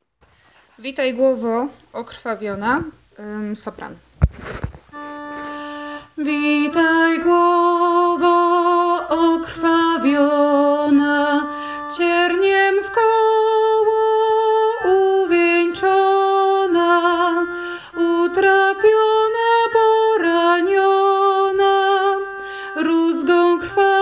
Sopran; Witaj Glowo okrwawiona; piesni wielki post

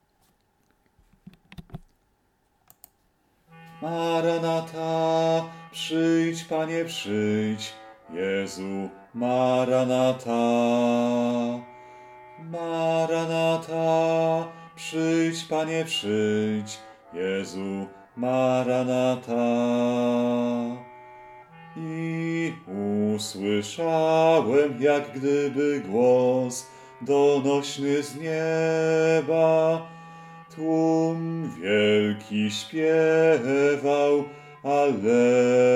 Maranatha Bas; Adwent

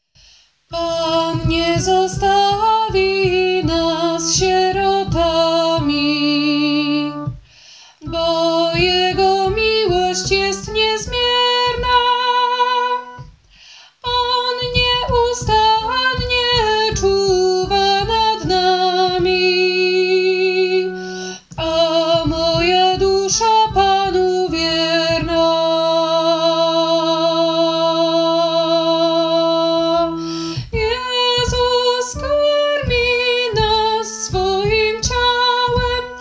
sopran; Panu zaufalem

